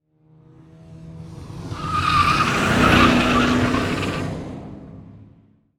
Transportation Car Race Car Drive By Near Turn Tires Squeal